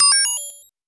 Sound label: MOOG HAT; AMG - Vince Clarke Lucky Bastard